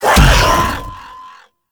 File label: Whirlwind Dash Impact C; Warframe; Warframes; Caliban